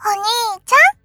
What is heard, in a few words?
combo break